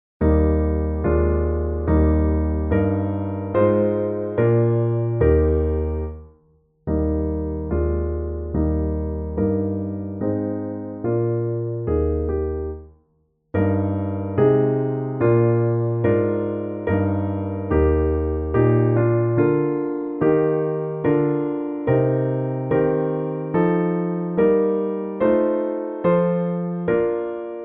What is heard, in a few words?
Piano Sample; Bach; Wachet auf; Eb